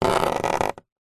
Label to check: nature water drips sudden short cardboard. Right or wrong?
Right